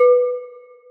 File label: celesta, programmable speaker